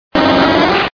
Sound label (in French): Cri